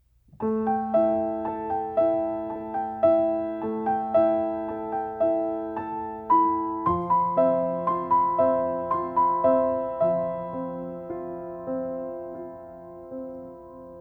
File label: Rain Rhythm